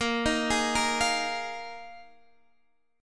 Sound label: A sharp 7th Suspended 4th, Guitar, DADGAD